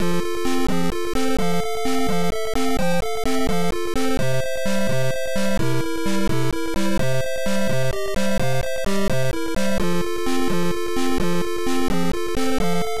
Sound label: Balloon BGM; Pitfall II The Lost Caverns; MSX